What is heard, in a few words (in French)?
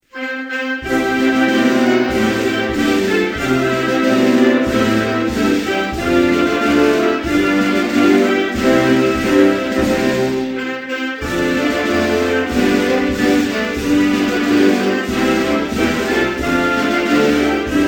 Maldives, Hymnes nationaux